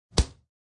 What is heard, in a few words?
A punch.; Punches and Hits; Combat